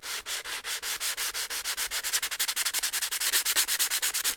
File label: SNDPAPER, SAND PAPER; 1000fx